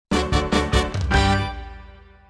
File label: win